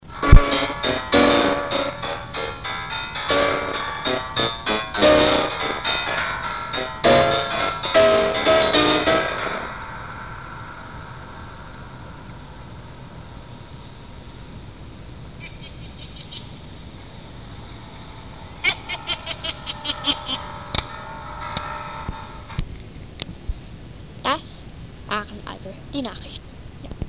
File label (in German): der lachende pianist; Eine in den 80ern gemachte Aufnahme